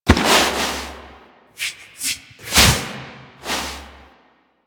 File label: Wolf Foley Pet; Animals; Warframe; Quests; Duviri